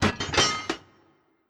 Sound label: Tank Metal